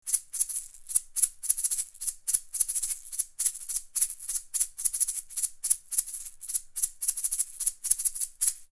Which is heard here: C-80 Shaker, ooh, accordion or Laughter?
C-80 Shaker